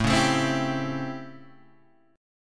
A 7th Sharp 9th, Guitar, Open G

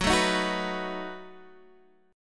B 7th F sharp

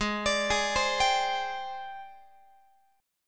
Ab Major Sharp 11th Ab down Guitar; AbM#11 arpeggiated; x,16,17,18,16,18

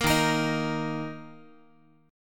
Eb 5th, Guitar; Eb5 strummed; 13,11,13,13,x,x; JGuitar

